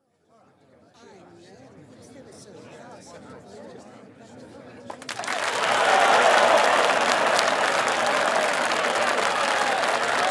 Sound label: Murmur Bed Big Cheer